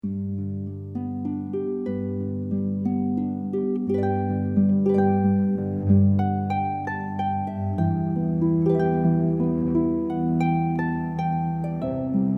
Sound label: harp flute cello